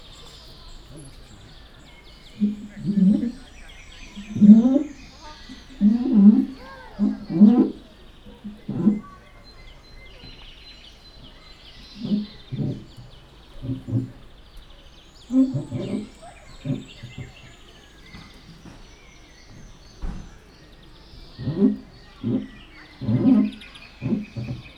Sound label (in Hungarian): orrát a plexihez nyomva mozgatja, közelről, fehérkezű gibbon; jaszberenyi zoo 2016